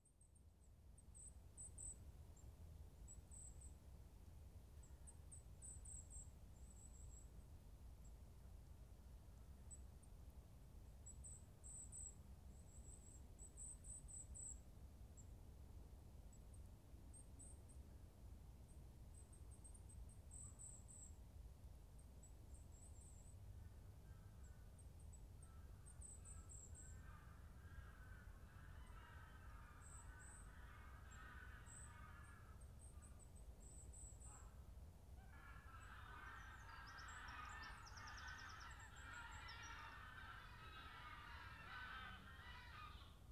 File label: Geese, Bonaly Country Park